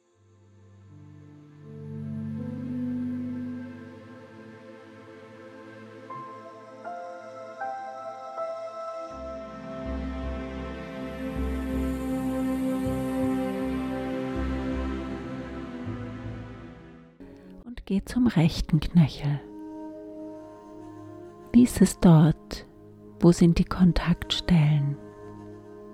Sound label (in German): Musik